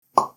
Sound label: hover sound